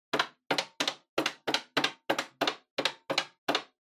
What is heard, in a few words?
Public Phone Button Pattern Mid